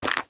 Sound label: Cave Footstep; The Inn